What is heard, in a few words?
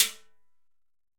Timb C H Cascara, Timbales, Latin Percussion; Drum Hits; Ableton; Factory Packs